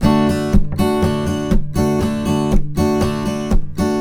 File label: Blues Guitar Samples, RIFF; ILIO; Fretworks